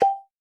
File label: countdown